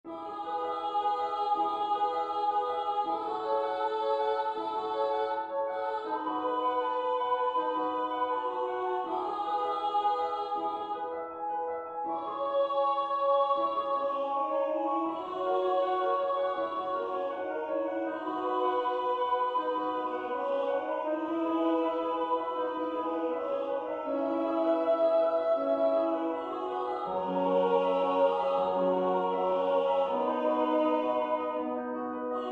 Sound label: ave maria all